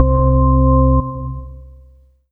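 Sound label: Kawai K11 Draw Organ C2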